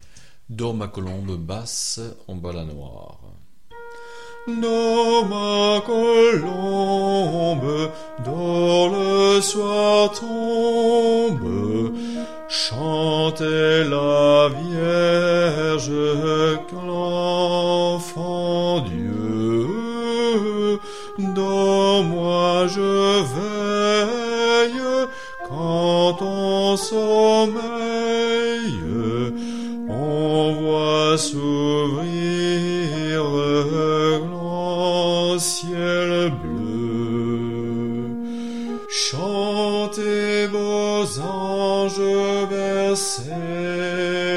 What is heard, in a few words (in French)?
basse; Dors ma colombe